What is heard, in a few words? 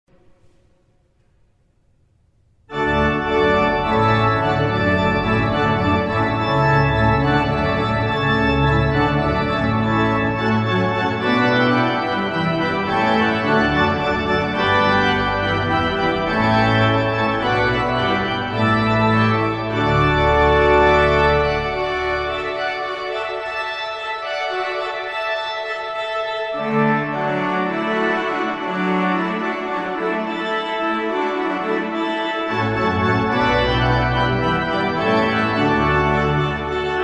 Hornpipe; from Water Music; Handel; wedding music selections